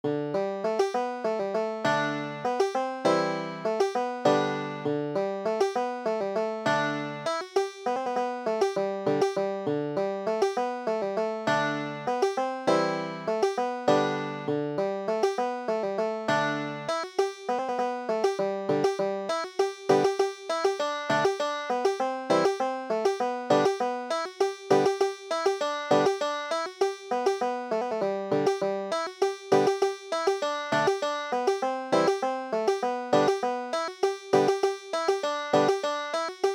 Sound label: stormstown, banjo